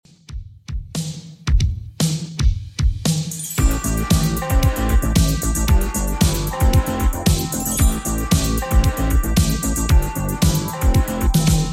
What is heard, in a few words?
80s Redrum 114bpm